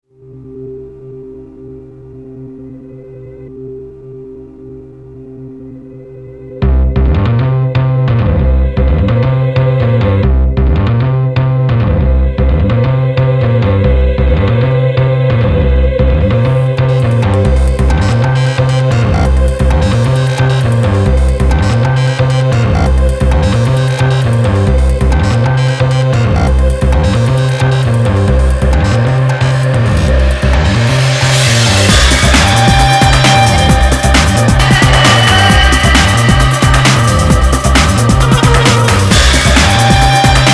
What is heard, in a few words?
Electronic; American McGee Presents Bad Day LA